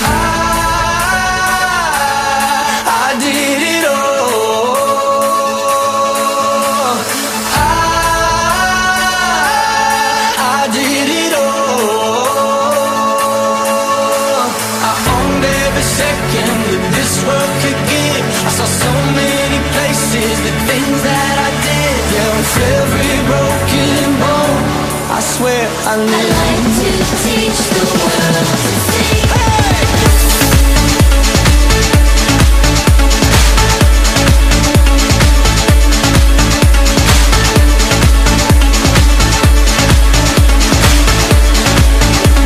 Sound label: Drill Team Tryout Music Jazz; Legacy HS